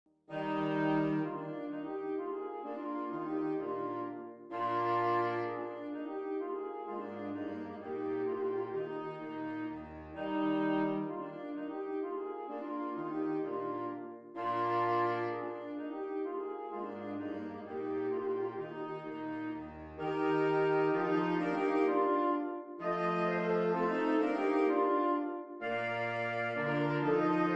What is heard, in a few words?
Divertimento in Eflat Menuet; Haydn; Saxophone Quartet